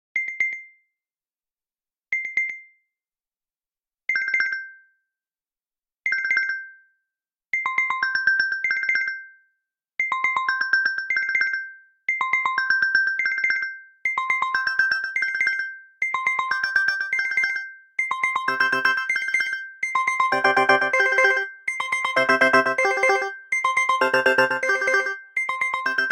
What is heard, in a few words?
Wake Up, alarms; Huawei Mate-40-RSR-Porsche-Design